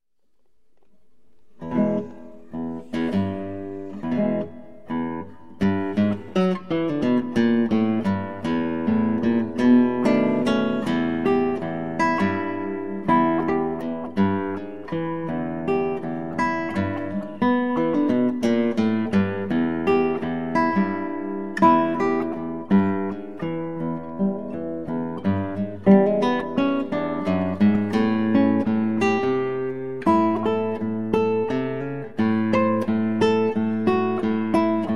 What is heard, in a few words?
blues